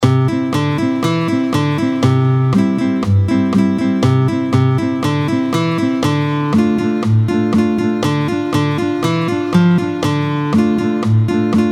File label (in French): Stewball Vite 120, picking